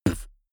beatbox bass drum

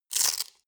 velcro undo roomy short

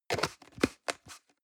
plastic can small lid screw close hard

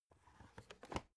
alu case small handle

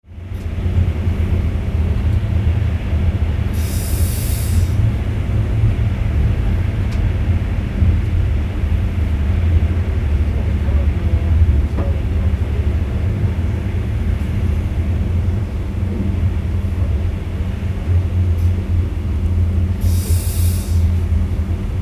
train main line cabin inside calm

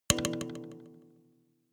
notification kalimba delay body hit

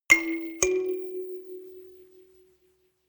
notification kalimba rattle second up

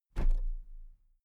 toolbox place upright soft

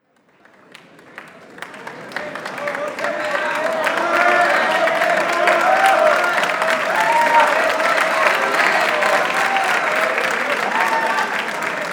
applause slow

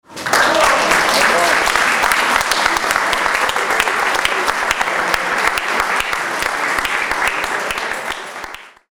applause

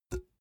empty can pick up hard

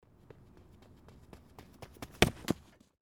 running across base

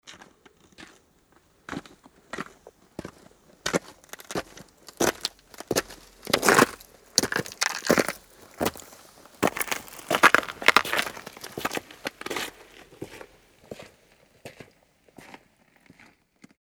steps in snow

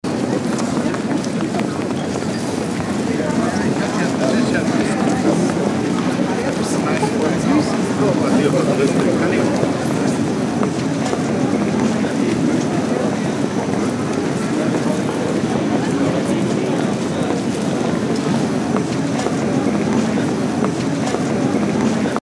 mall atmo

